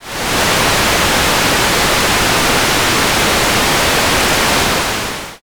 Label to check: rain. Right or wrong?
Right